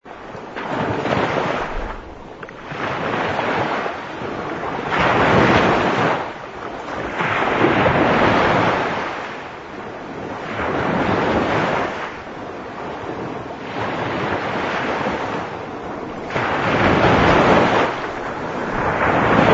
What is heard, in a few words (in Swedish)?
sipadan vågskvalp; borneo